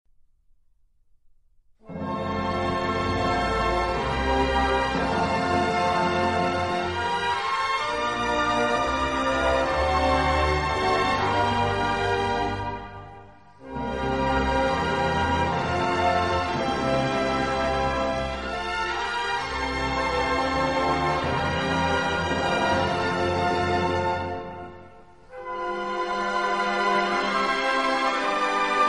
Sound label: National Anthem of Austria